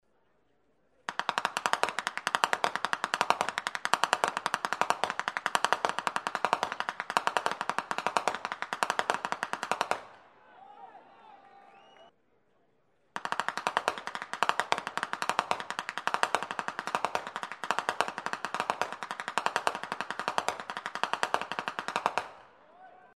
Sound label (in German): schnalzen; rupertigau